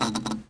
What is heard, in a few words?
BULB FLICKER SND; Descent 3 Demo